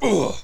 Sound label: pain; player